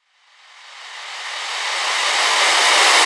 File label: FX Uplifter